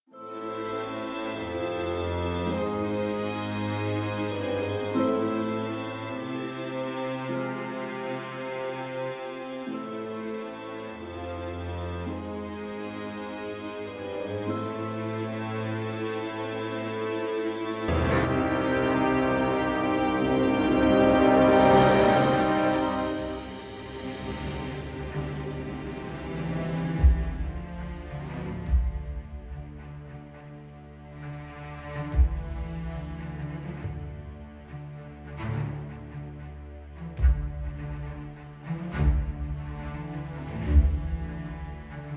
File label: Music Idle; Zak Storm Super Pirate; mobile